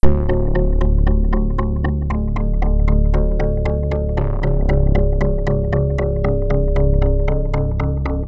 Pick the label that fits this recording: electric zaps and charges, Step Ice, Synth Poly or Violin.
Synth Poly